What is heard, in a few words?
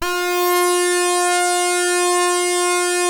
SAW RES WET, POLYRES SAW2, Synth; InVision Interactive; Keith Emerson; The Most Dangerous Synth and Organ